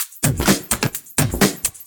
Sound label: Electro Loop 128BPM; Vengeance Samples